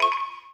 menu back; Katou Megumi by iEddie

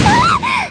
combobreak; Kaguya skin v1.1

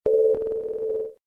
menu hit; Miu Matsuoka-2017-08-10

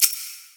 click short confirm; Sunset Horizons; seysant

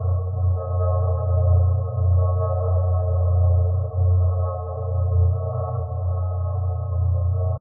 pause loop; Stargvze v2

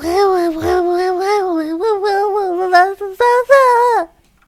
failsound

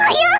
combobreak; Senko-san-Hideru

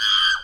combo break; rafis gothic hs

